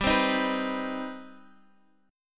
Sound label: C Minor Major 7th G